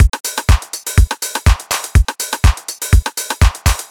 Modern Chicago House

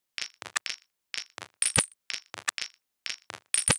perc only

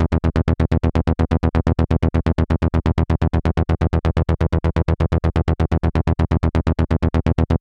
Arp

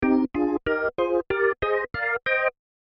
C minor scale triads

